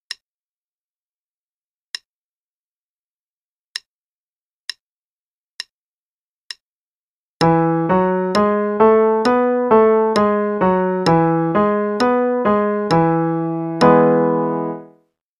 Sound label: E Major Pattern (qn=66); CM Level Prep